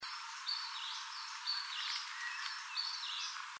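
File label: Phylloscopus regulus, Laoling, Kiina; Call, 21.5.2002 Laoling, Hebei, China; (very poor recording); Phylloscopus reguloides; Linnut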